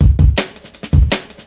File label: 163 bpm funki 8ths, drum loops and breaks